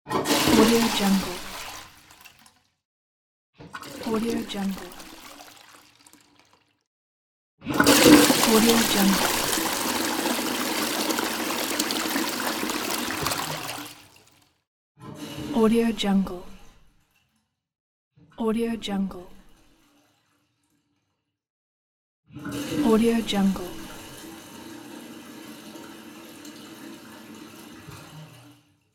flush

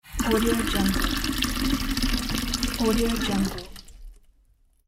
water flows from the tap into the sink

